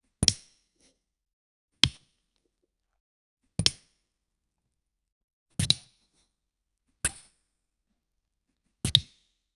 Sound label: crisp shhhk of knife slic, food preparation